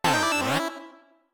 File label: spawn; Techmino